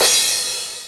Dry Cym, drum machine

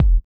BUZZER; Spectrasonics; Metamorphosis

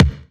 kick, Hardcore Breakbeat

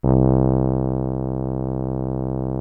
BRASS C1, ORCH BRASS; Greytsounds VOL-1; ORCH BRASS 2

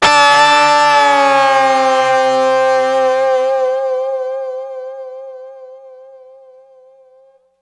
Guitar Bender, Keyboards

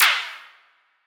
Analog Clap, snares; RoBKTA Sample Pack Supreme

